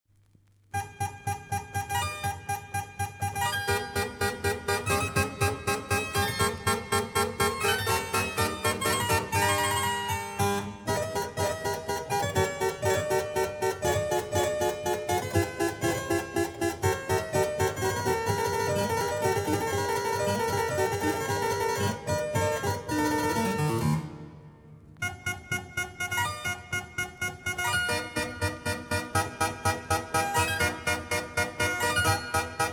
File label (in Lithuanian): Pjeses klavesinui; J. Ph. Rameau; Vista